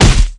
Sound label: punch boxing face hit